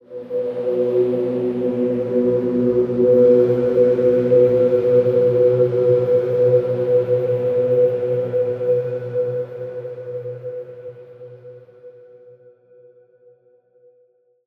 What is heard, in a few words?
heaven synth texture melodic phrase soft; Bluezone; Ethereal Ambient Samples